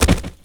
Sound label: log